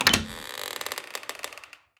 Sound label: Burglary Interior Door Open